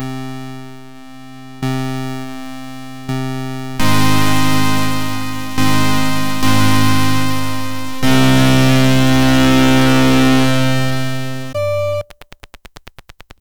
keyboard sound of various

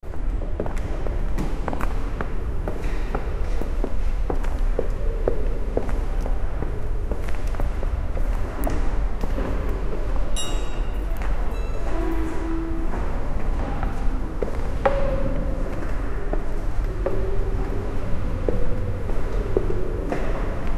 walking around the surger, haunted

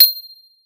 ui

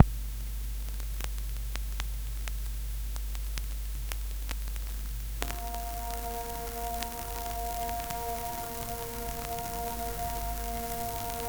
analog tape hiss noise; vinyl

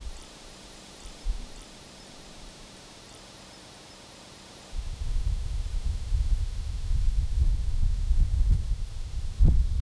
wind through trees

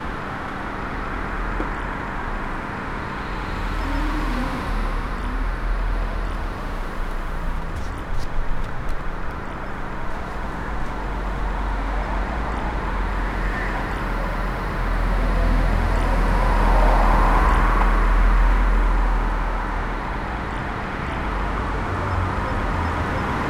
city traffic people car

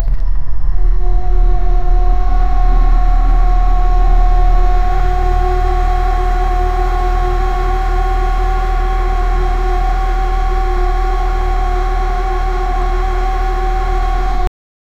a fierce urgent howl; engine

